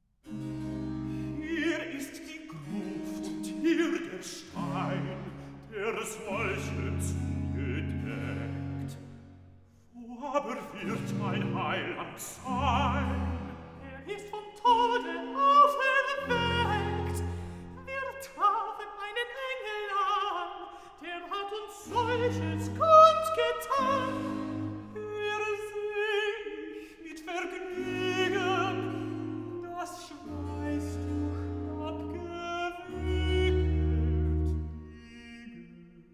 Recitativo, BACH for ALTO; Easter Oratorio; Hier ist die Gruft

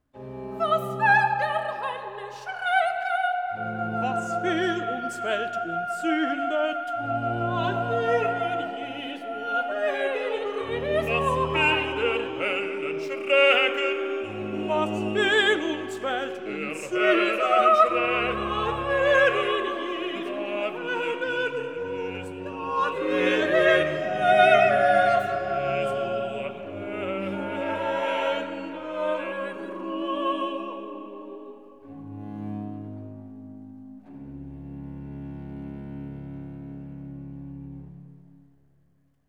Recitativo (Soprano, Alto, Tenore, Basso); BACH; XMAS ORATORIO; Christmas Oratorio

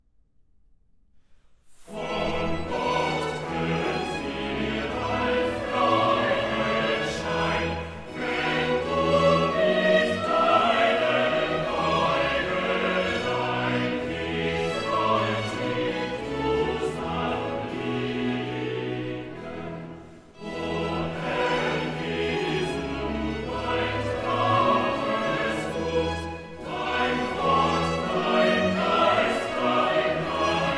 Chorale; Bach; Cantatas; BWV 172; DGG; Erschallet, ihr Lieder

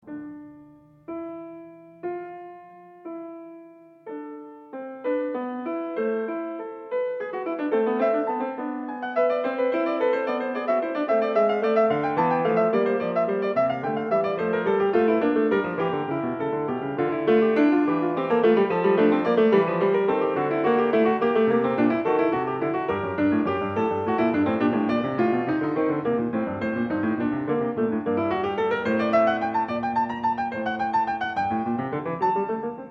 Fugue in d; BWV-899; Bach; Prelude & Fugue in d

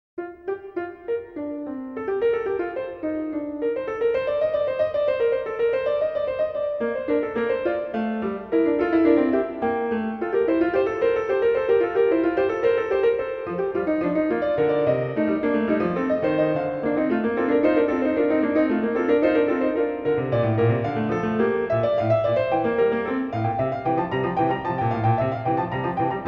Fugue in B-flat Major, CLAVIER; BWV 866; BACH; WELL-TEMPERED CLAVIER

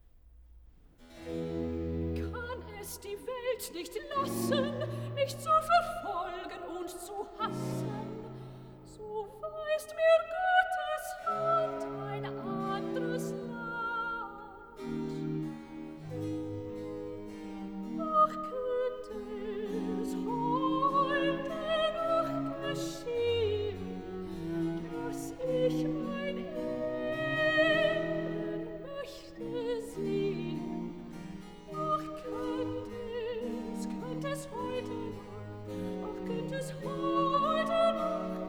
Recitativo Sopran; vocal; soprano; BWV058; Kann es die Welt nicht lassen; BACH

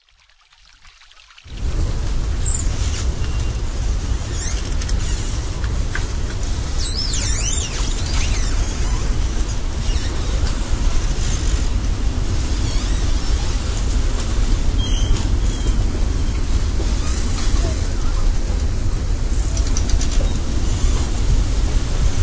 cider forest of a windy day; Toshiya Tsunoda

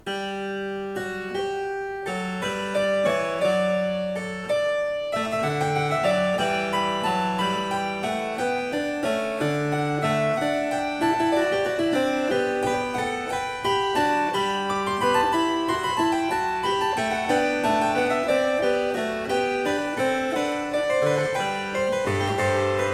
Variatio 24 Canone all'Ottava a 1 Clav, Cembalo; BACH; Goldberg Variations

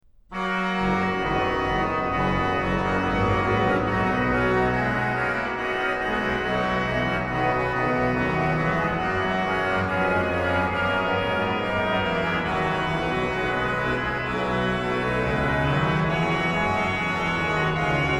organ; BWV0635; Dies sind die heilgen zehn Gebot; BACH; BACH ORGAN WORKS; CHORALE PRELUDES

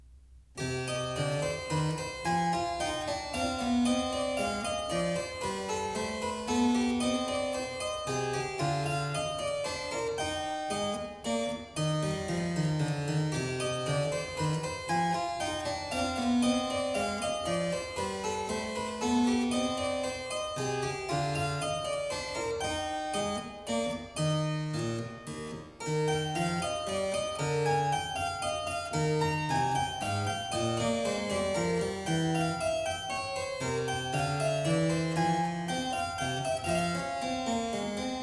C minor, Menuet; French Suite No.2; BWV 813; BACH; CLAVIER WORKS; FRENCH SUITES

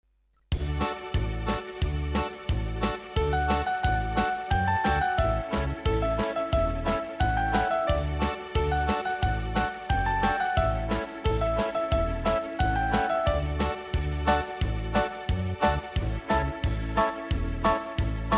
Instrumental, karaoke, Bulgarian

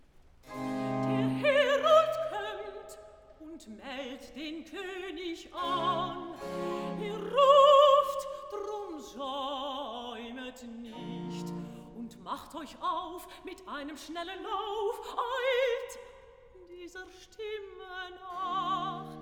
Recitativo (Alto); Der Herold kцmmt; Bach; Cantatas; BWV 030

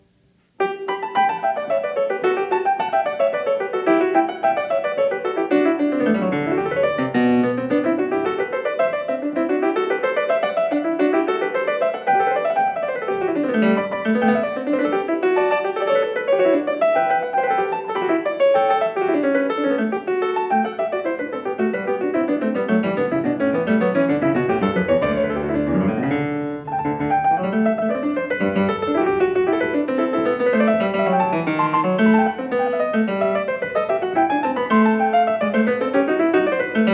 Variation 1 a 1 Clav., PIANISTS; BACH; GOLDBERG VARIATIONS

